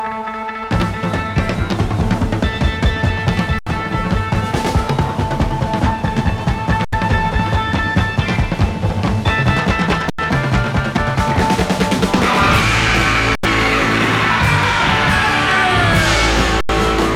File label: Drum Solo; Kilburn 1977; December 15, 1977